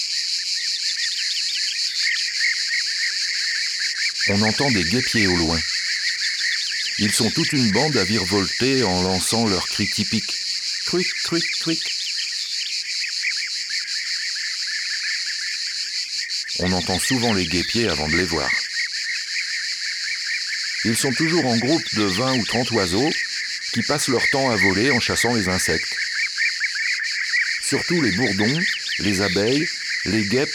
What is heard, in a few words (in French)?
Guepier d Europe; ABC de la nature